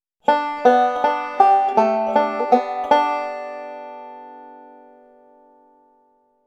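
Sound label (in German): g lick in c ueberleitend, banjo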